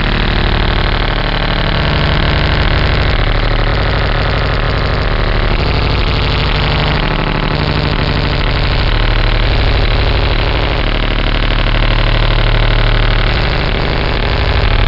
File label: Big Russian Radar